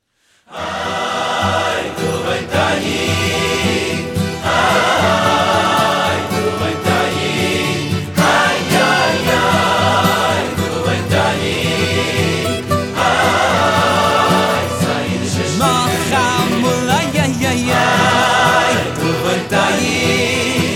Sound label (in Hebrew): צלצול